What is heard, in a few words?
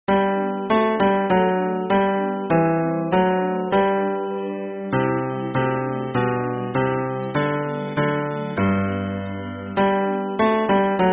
hymn bass